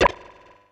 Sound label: Egg Throw; Yoshi; Super Smash Bros